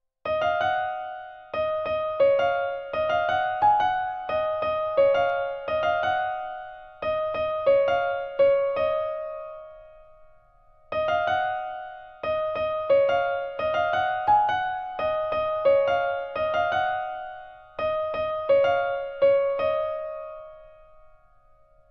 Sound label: love bgm piano; kadhal konden